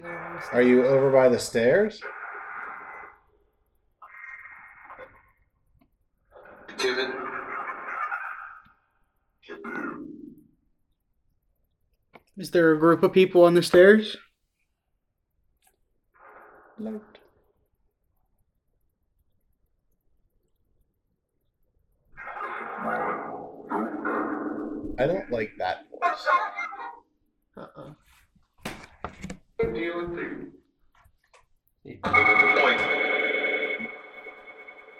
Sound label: Tarot Card Room Phasma Box